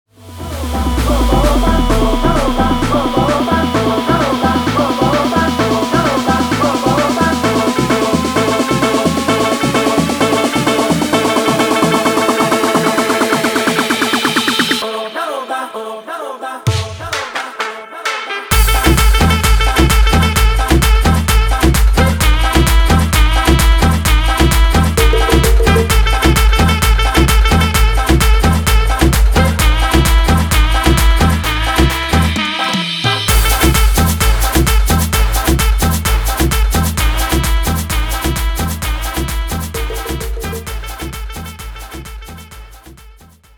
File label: guaracha remix 130 bpm; Reggaeton dj remixes